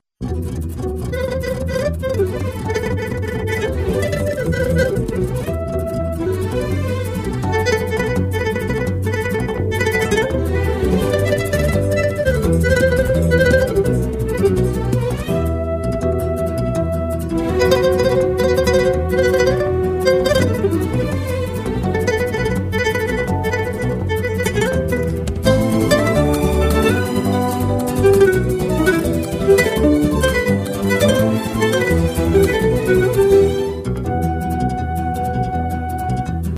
crazy guitar; Ringtones

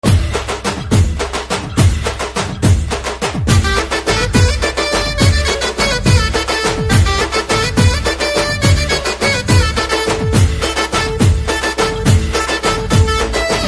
Theme Ringtone, Devotional Ringtones; Navratri Special Durga Mata Ringtones

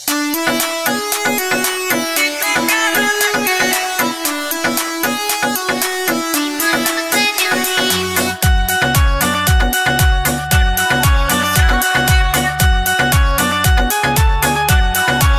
Khatu Shyam Music; Navratri Special

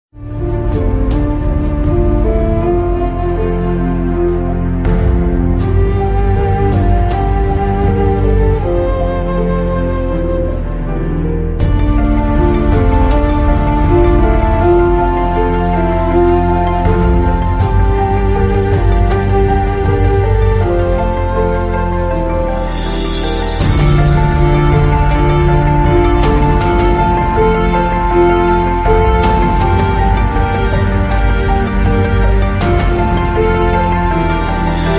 Emotional Background Music; IPL; Ringtones

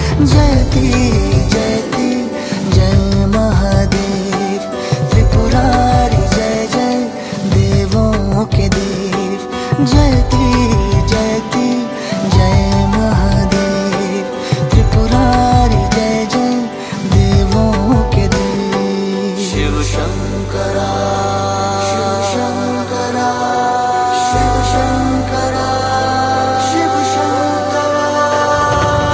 Shiv Shankara Bhajan Ringtone; Devotional Ringtones

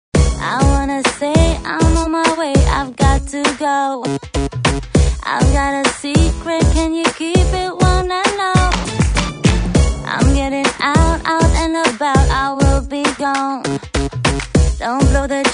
Movin, Original Ringtones Nokia 6101

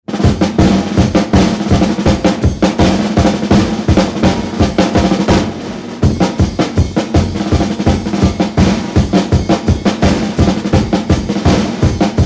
Drums Pattern Fast Rhythm; Ringtones

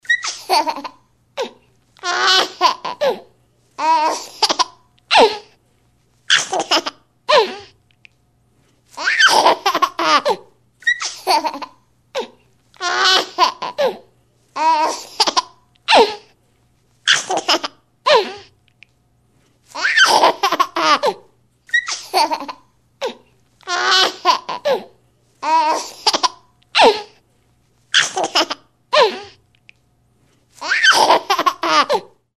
Joyous Baby, Funny baby ringtones

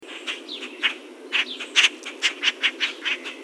The sounds of birds

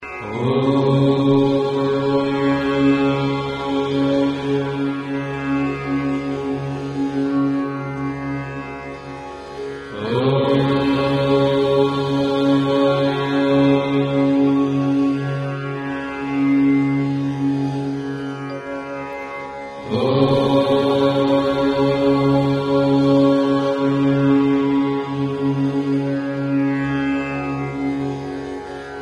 Aummm Om Slok; Religious; Devotional; Ringtones

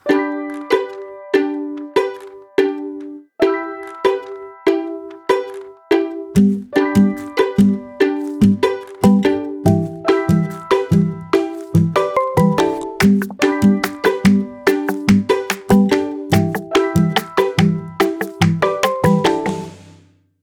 optimistic, ringtones, OnePlus 6 Ringtones

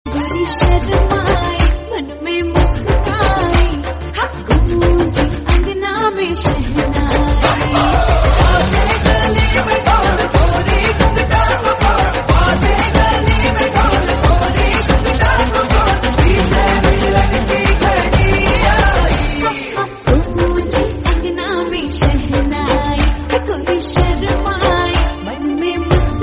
garba navratri song ringtone; Navratri Special

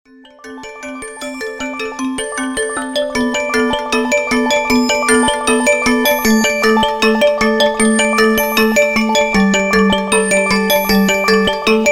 Tremolo, Galaxy S10 Ringtone; Latest Music Ringtones

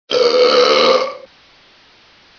Smelly FX Burp; Funtone; Ringtones

